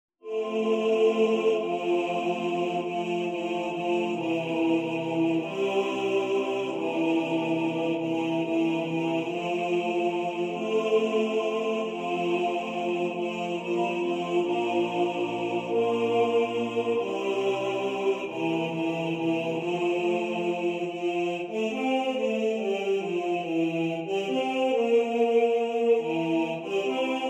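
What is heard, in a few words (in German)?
Bass2 im Vordergrund, Männerchor; Hudri schöane Marie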